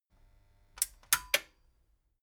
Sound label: metal button stereo click power off slow